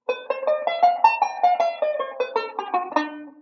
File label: Mixed Scale, banjo; Beyond Chord Melody Volume II